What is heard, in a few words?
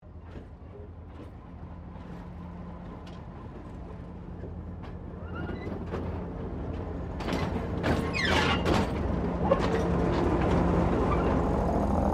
Train Diesel Shunter VA1 Class 1947 Tasmanian Passing; Bellarine Tourist railway vehicles; Trains